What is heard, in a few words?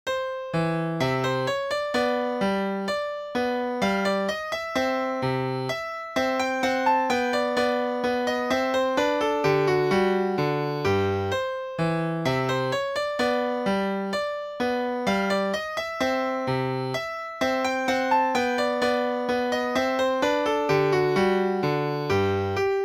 Minuet